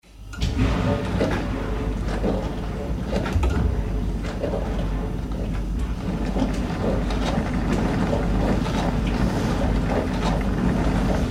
Dishwasher Start and Run, Various dishwasher washing activity; Appliance; Tom Hutchings